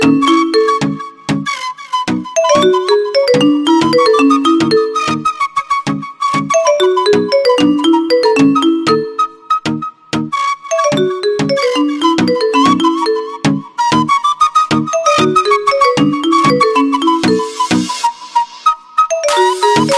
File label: nokia ringtone